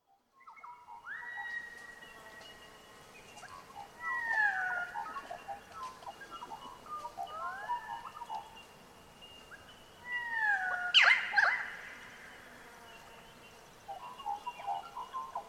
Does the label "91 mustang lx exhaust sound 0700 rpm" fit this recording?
No